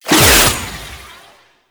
Kuva Lich Finisher Parazon Throw; Warframe

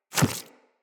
Inf Small Walk Default, Footsteps; Infested

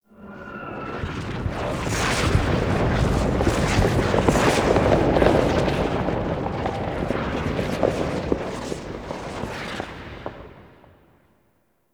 Wind Crackle Rumble; Tau

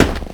Crewman Footstep Walk; Characters; Corpus; Crewmen; Footsteps

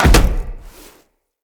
land heavy default, footsteps; Characters; Tenno

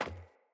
Sandals Run Metal, Footsteps; Player

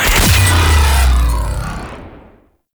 Redline Hit; Warframe; Runner

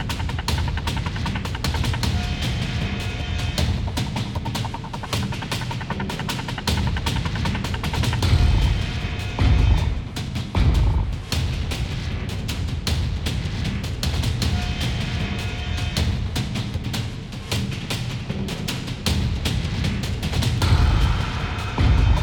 Middle Loop, Music; Warframe; Cinematics; Chimera Quest; Ballas

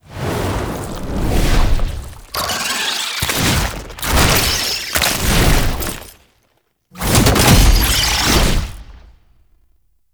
Intro Cin SFX; Warframe; Oraxia

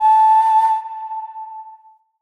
Flute Alt A; Player Instrument

